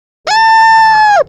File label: falling in a well funny